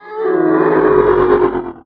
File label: para voc mumble